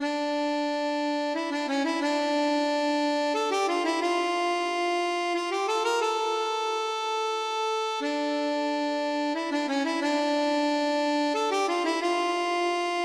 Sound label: harmonica